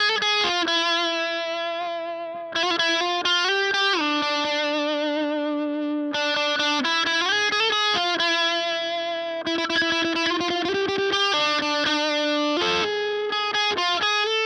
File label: Epiphone SG Bridge